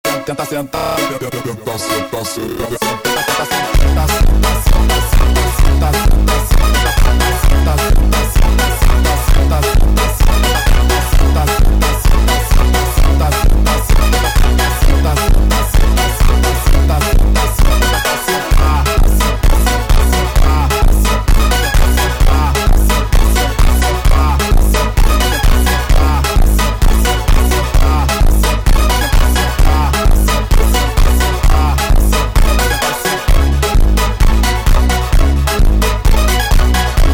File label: Montagem Vozes, funk